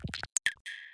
Glitch

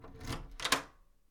Door Unlock

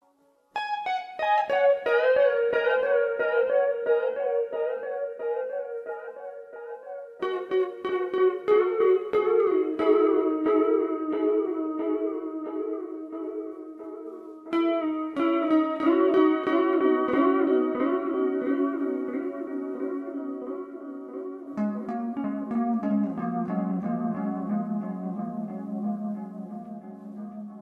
Slow Guitar Solo Echo

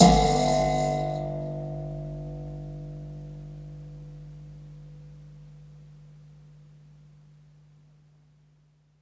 Spring

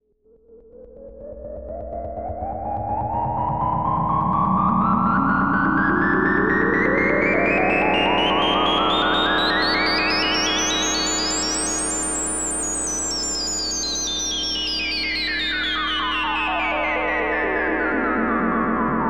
Modular Reso

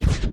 Hit Swoosh

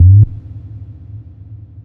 Boom

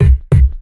Drum

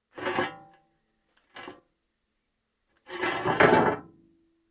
Manhole remove

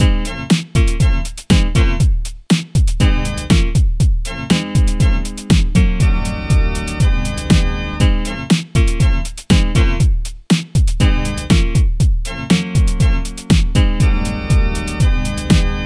Pop Music Smooth Loop; Skibka Pacter

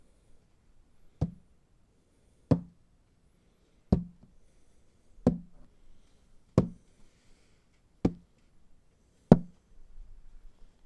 Tapping Outside

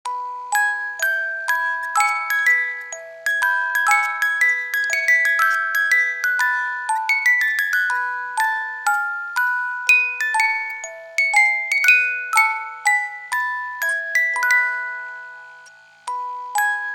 Music Box

